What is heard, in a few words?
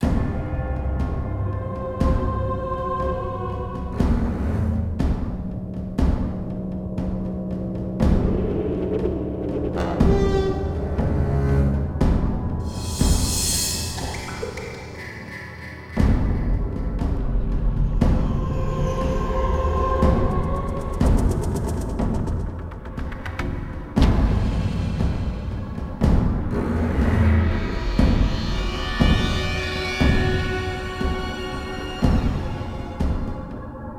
Combat